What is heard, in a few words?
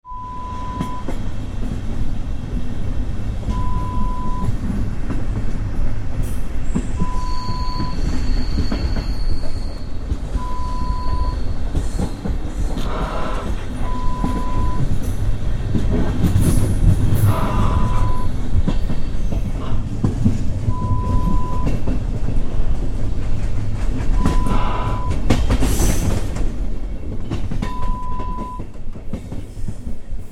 train external sound